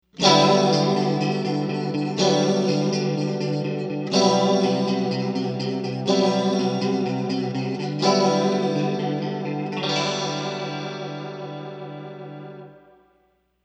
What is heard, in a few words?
Clean Variation, Spider II